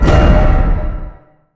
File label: Button Bottom Bar Click; Custom UI Sounds; Elden Ring